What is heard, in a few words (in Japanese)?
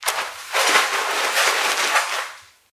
体をあらう